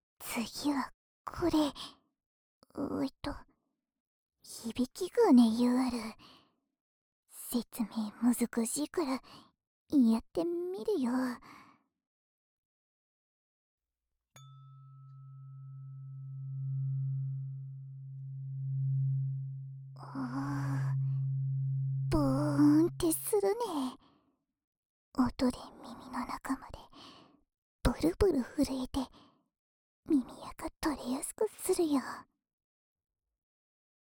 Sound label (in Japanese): 【右】音叉, ASMR